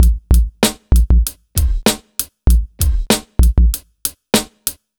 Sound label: 97 BEAT, Underground Hip Hop; USB Soundscan vol.02; AKAI